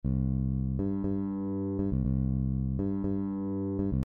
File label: Bossa Nova Groove Variations